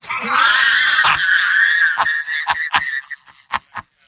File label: soldier laugh long; mvm